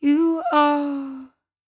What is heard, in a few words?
57bpm Am, Velvet Vocal; Zero-G